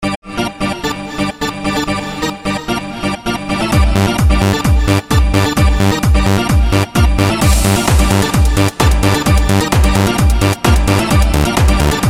DANCE PARTY

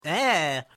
Ehhh

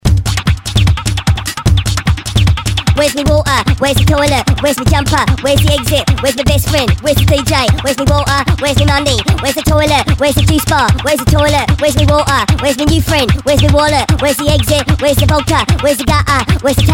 Uk Clubber

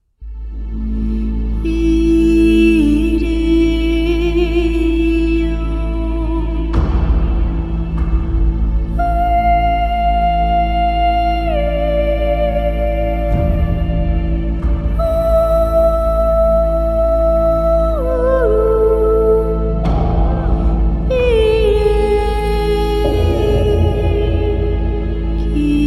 Haunting

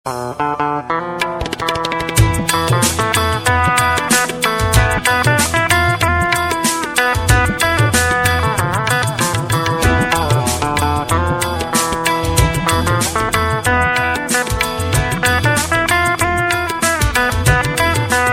Fusion Guitar

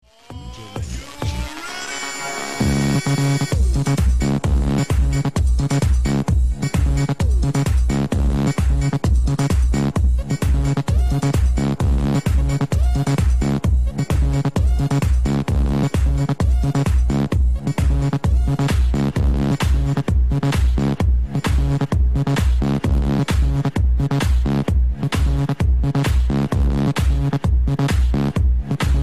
Funky Beat

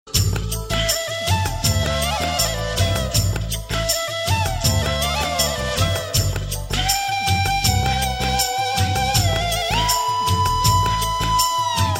Heart Touching soft

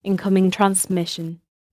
Incoming Message

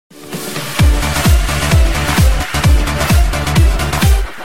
Clubbing Sms

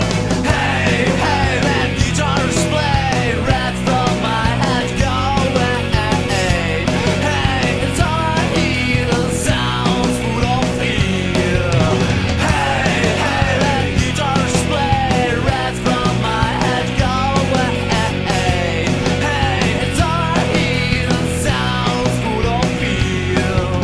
ragga guitars

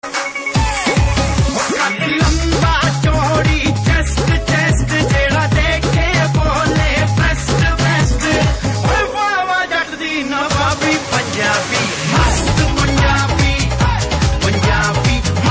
Mast Punjabi New

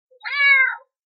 Cat

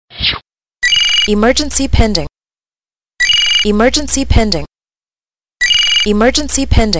Emergency Msg

